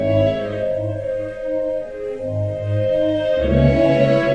110 phasing classical loop; STRINGS ORCHESTRAL CLASSICAL LOOPS

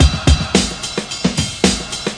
classic brk; VIBRIS CLASSIC BREAKS

